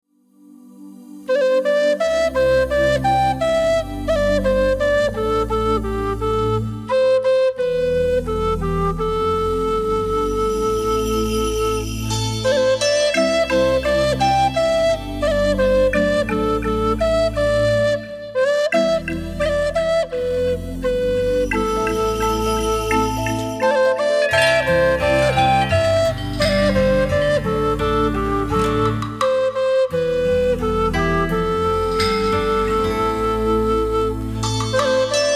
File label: Lullaby, Whistle; ar tonelico ii melody of metafalica soundtrack; Reisha's Lullaby